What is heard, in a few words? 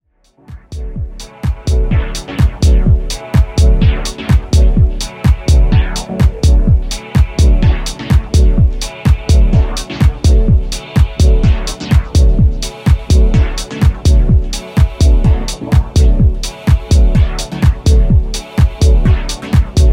dub harmonics